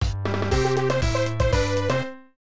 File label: Unused Jingle; SNES